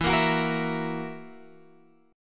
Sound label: E Suspended 2nd Flat 5th E down Guitar Open G